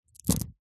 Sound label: industrial metal ball bearings multiple down on carpet